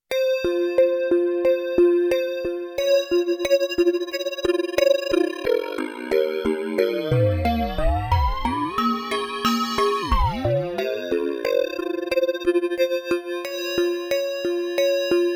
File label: FM Linear Synth; Montage